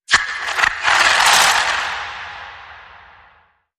Glitch Card Shuffle

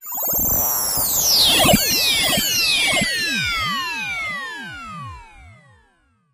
Warp Field

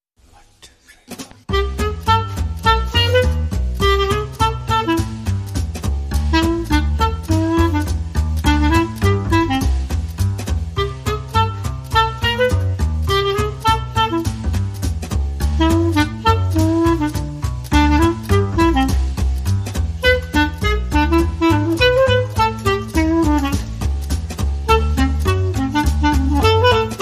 Clarinet Version, No Guitars

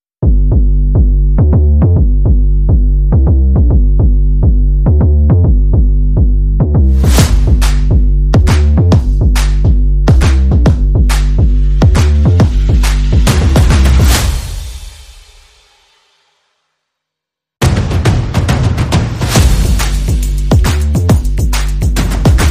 Percussion Bass and Effects

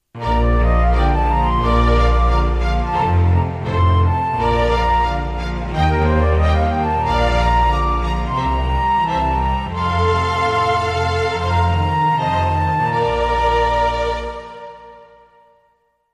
Minuetto

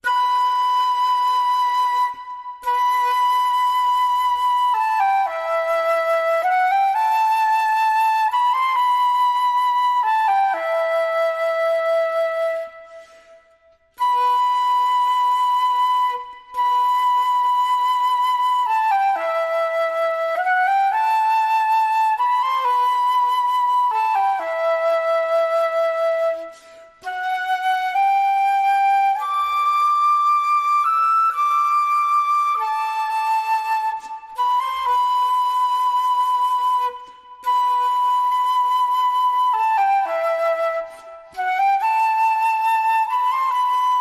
Flute

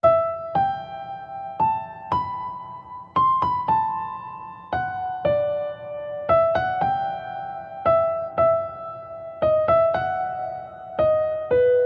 What Child Is This, Piano

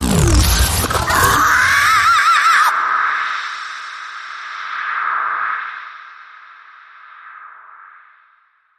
Yell Out

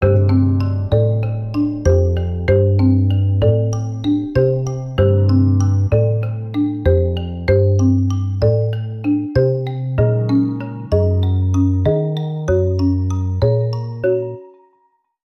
Cool Marimba

